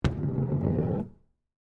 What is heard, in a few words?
Ski Ball Return, Arcade; Arcades (Sound Effects:Games); BlastWave